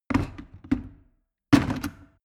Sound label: plastic water boiler place hard clumsy